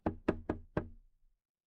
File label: impact wood knock